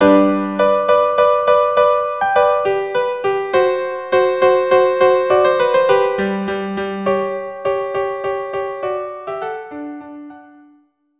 Minuet violin viola; Don Giovanni

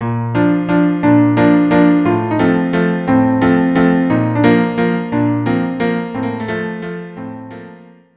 cello duo

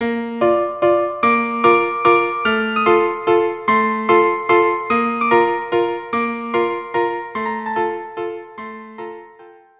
violin duo

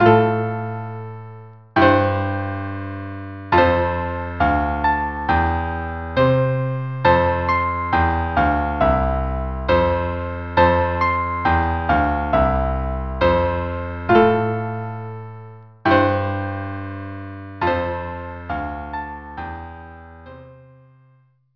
trio; kiev

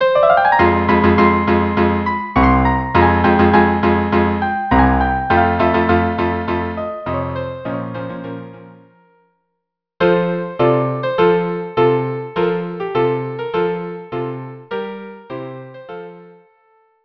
violin viola cello; Toreador song

